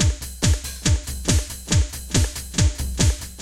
techno 140

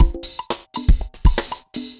dancefloor perc 120 bpm

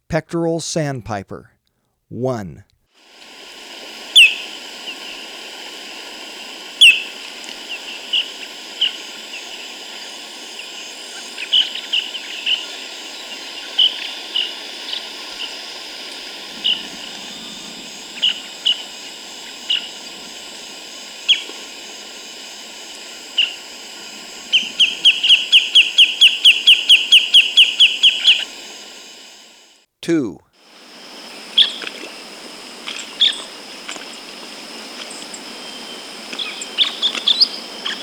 Pectoral Sandpiper, Birds of Ecuador; S. America

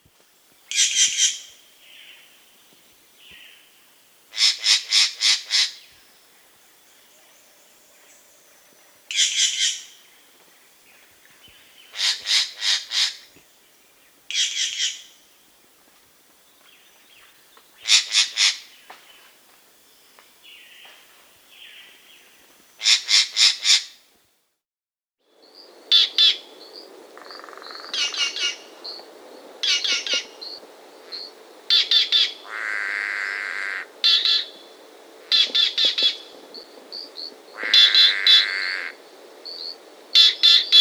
Green Jay (Cyanocorax Yncas); S. America

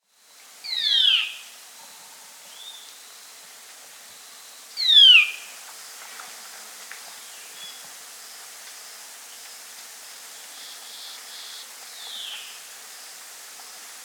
Band-tailed Manakin (Pipra fasciicau, S. America

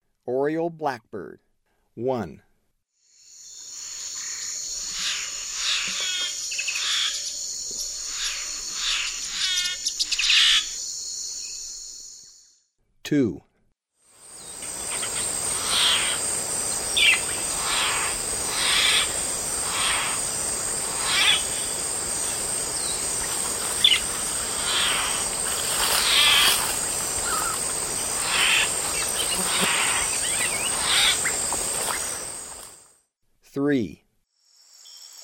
Oriole Blackbird; S. America; Eastern Lowlands

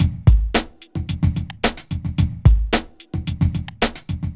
r n b kit 110

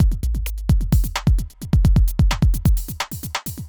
fast break 130

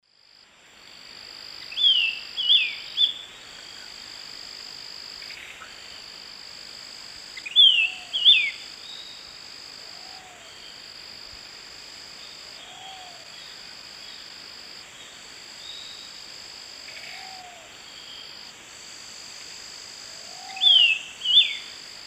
Corythopis torquatus, song; S. America; Eastern Lowlands

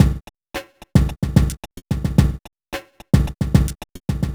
r n b kit 110

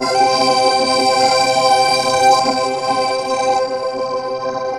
Fuzzed Pipe; Electronica; Tonal

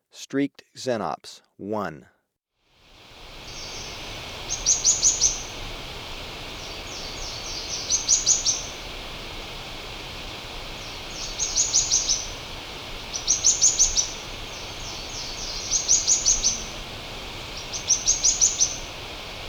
Streaked Xenops; S. America

